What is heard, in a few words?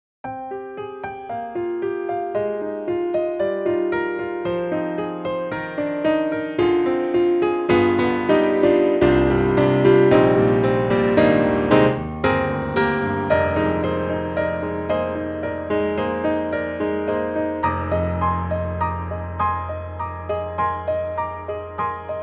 Grand Pianos German D Fingerprints German Grand; Synthogy; IvoryII